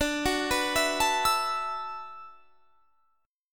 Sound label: D 6th Add 9th D; D6add9 arpeggiated